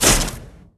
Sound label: lethal prism shot; durax